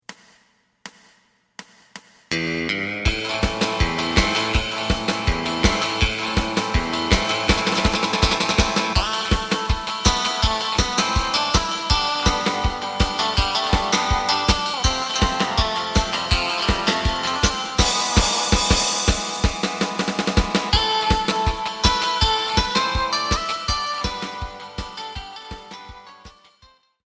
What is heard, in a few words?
bass